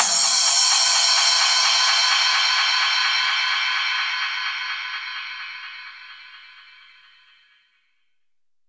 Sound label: Crash, Cymbals; Vengeance Samples; VEH3 Cymbals